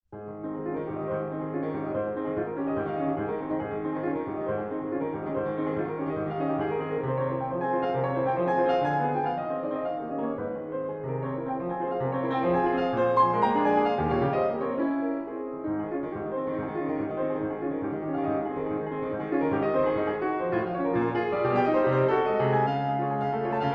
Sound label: Prelude in A flat major, Piano; Chopin; Op. posth.